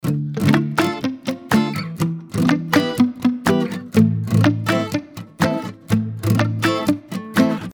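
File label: guitar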